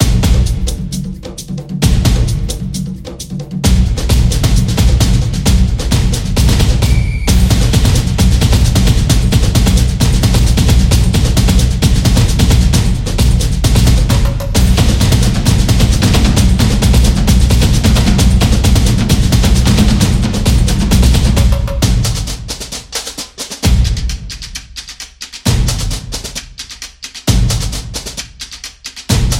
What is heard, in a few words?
Samba Dynamic Rhythm; soccer drums